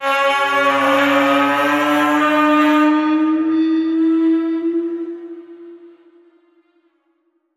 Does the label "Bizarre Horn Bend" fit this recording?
Yes